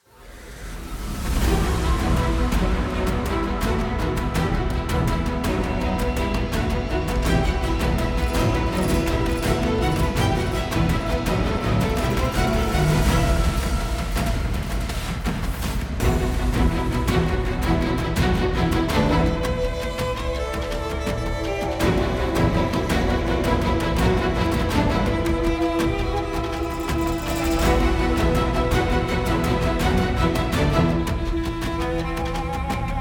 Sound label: BGM combat mob enemy; Babylon's Fall